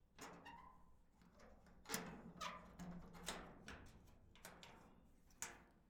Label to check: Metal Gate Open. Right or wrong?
Right